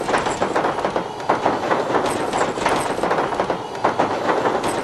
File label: Roller Loop